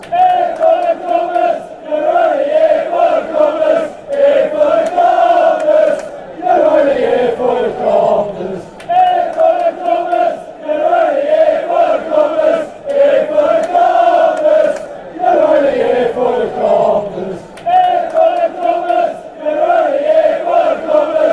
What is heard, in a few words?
only here for the cobblers fanchants